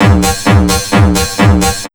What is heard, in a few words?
DJ 130 FX LP, Big Beat Drumloops; USB Soundscan vol.25; AKAI